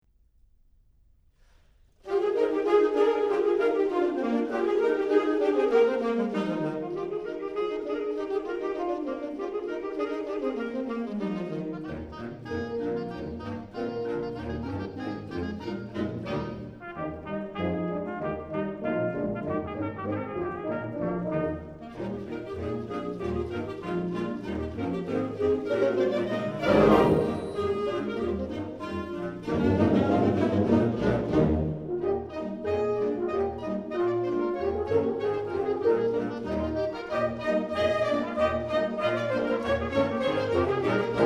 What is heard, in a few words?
WIND ENSEMBLE; SHEPPERD'S HEY by P. GRAINGER